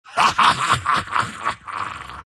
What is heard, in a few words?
troll laugh; dota; troll warlord